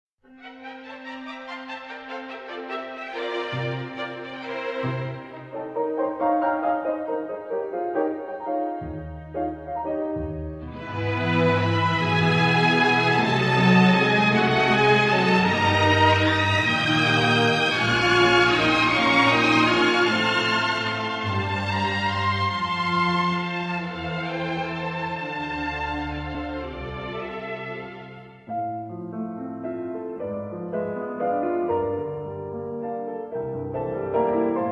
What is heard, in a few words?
Strings